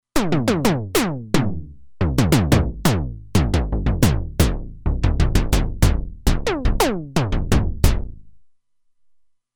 Afro Toms, Roland Alpha Juno-2; Factory 2

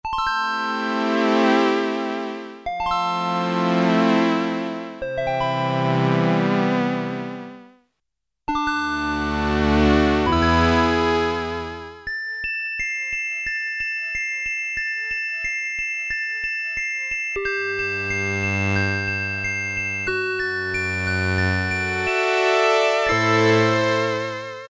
Lambda, Korg Poly-800

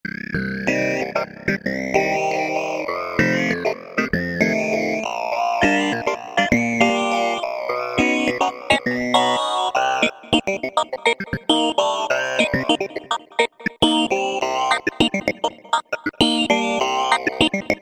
Vox Clavi, Roland V-Synth; Factory Patches

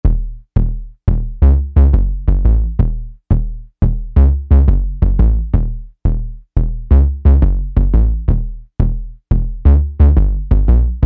Dirty Sub

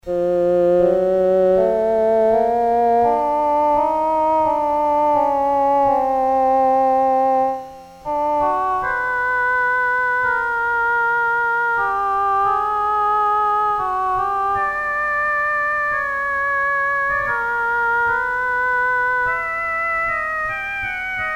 oboe, Casio SK-1; Harmonic component sound

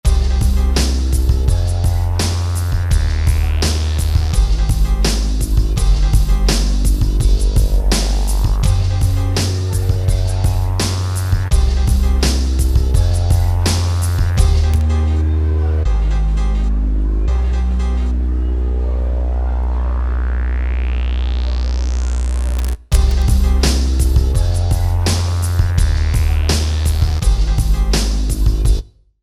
Crowd

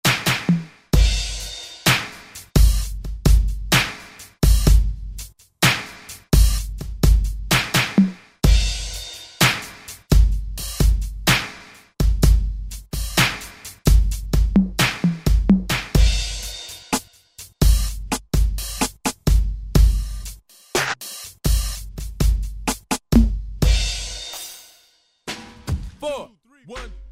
Hip-Hop; Roland SR-JV80-12 Hip Hop Collection

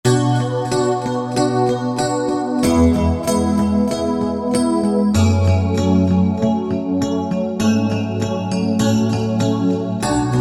Swimming EP

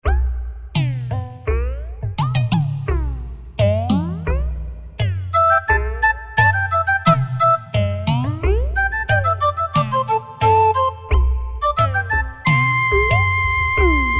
Yamaha TG500; Preset Performance; CO Happi

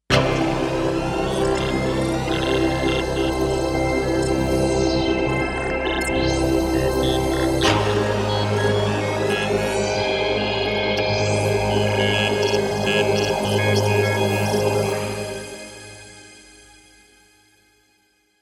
Power K5K, Kawai K5000S factory presets

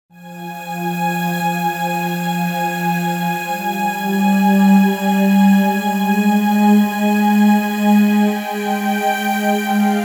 Roland JV-880; Factory Patches; Arasian Morn

